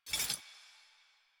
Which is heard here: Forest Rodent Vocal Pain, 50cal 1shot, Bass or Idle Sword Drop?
Idle Sword Drop